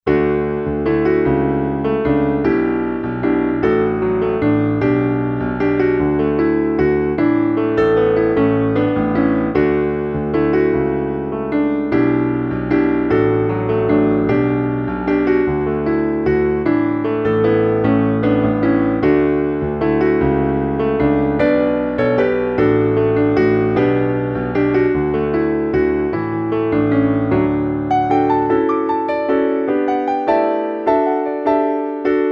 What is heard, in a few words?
Entrance piano